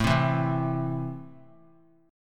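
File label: A Minor, chord strum; Am strummed; 21,19,19,22; JGuitar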